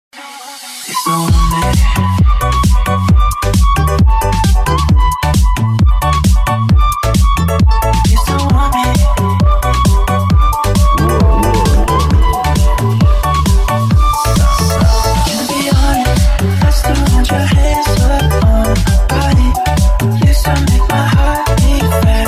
dance automotivo

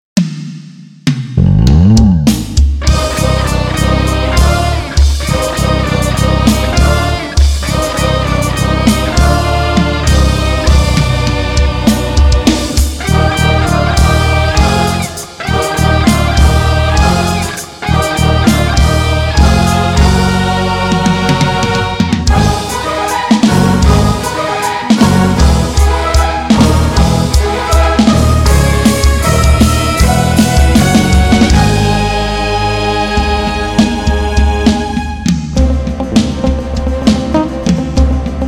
fusao de solos, instrumental, teclas

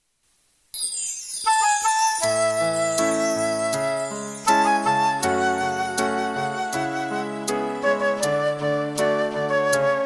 oceanos de cristal hino da escola azul instrumental

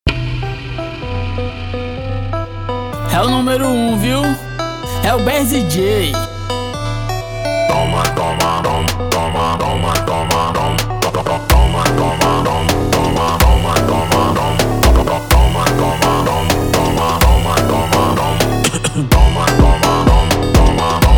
toma toma brasilian phonk bass

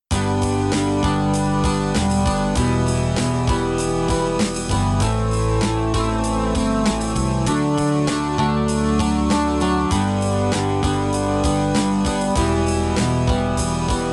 vejo chover la fora instrumental

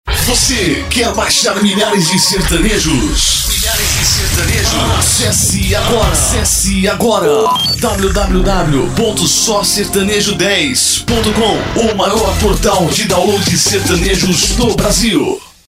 selo sertanejo; sosertanejo

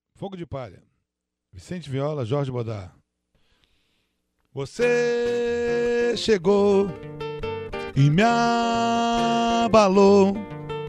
viola

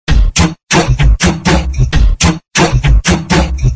beat tamborzado; Base De Funk 26

